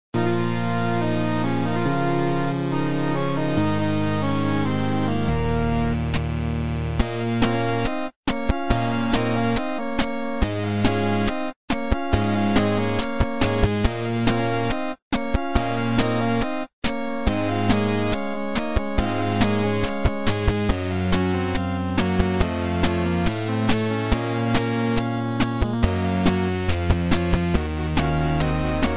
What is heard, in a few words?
8bit radio; zackryder